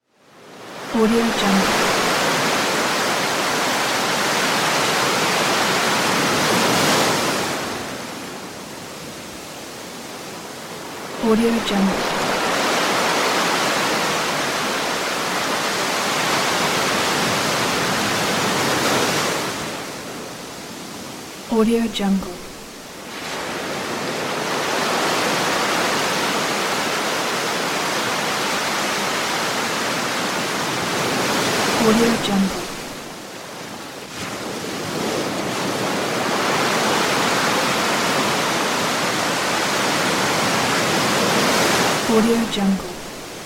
ocean waves

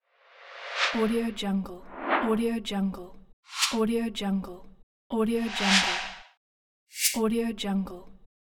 whooshes; minimal-studio

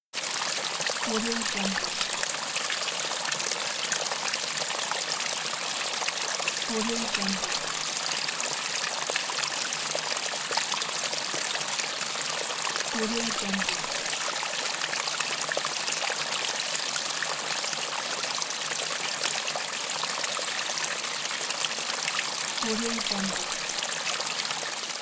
forest stream

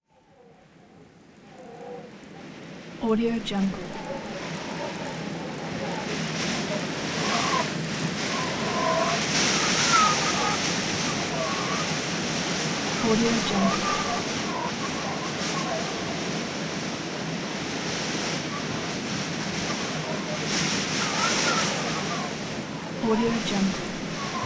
wind interior whistley blustering and cold

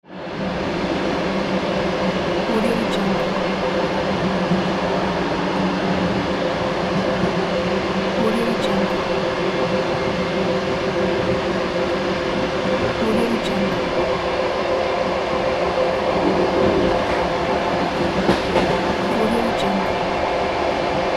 on the train

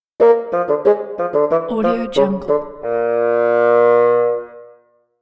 comical stinger

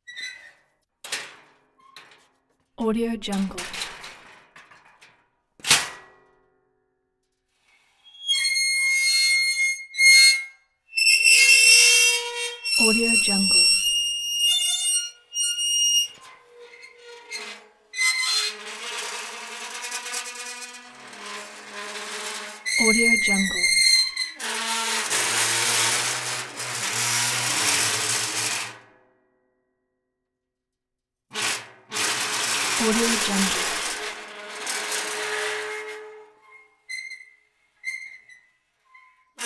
metal ronk huge steel rolling ladder giant

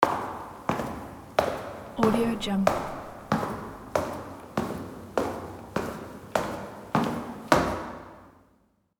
creepy footsteps walking cellar stairs down

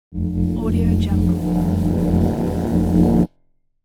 scifi technology

